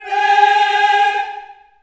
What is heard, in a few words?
G#4, Choir; USB Soundscan vol.28 - Choir Acoustic & Synth; AKAI